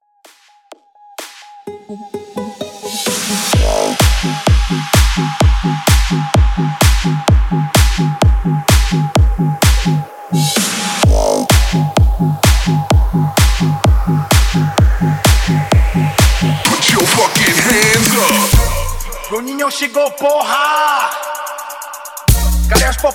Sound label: Bass house